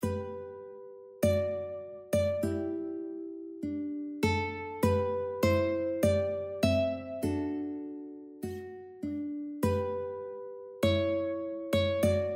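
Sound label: canadian national anthem uke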